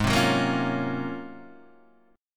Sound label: Ab 13th Guitar DADGAD, Ab13 chord; strummed; JGuitar